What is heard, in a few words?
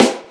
Yamaha CS6 B